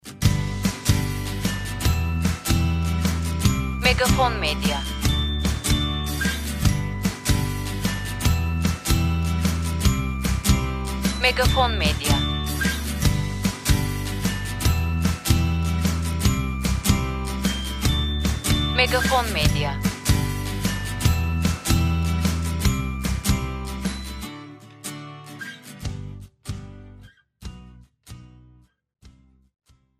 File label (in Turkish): SANTRAL MÜZİK